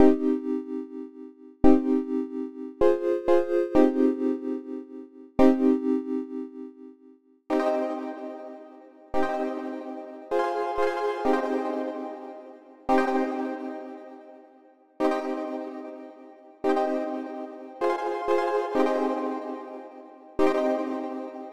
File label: Synth Loop